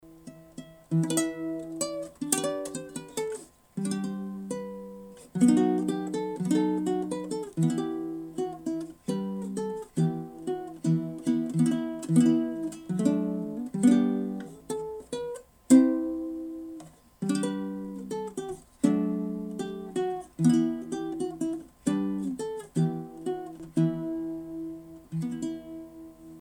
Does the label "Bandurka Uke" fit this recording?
Yes